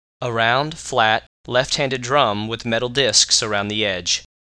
tambourine

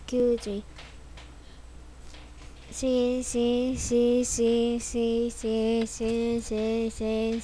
sea

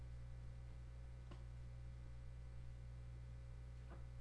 hit

